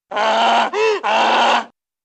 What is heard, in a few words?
Donkey Bray heehaw